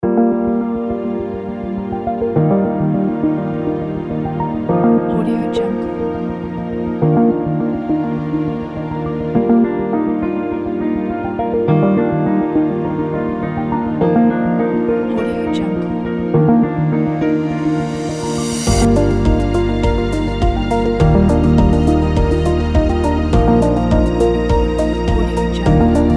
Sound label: uplifting corporate medical science music pack